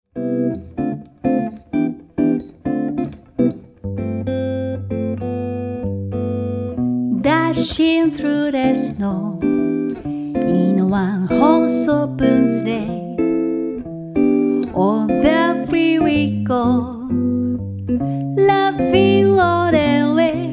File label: Jinglebells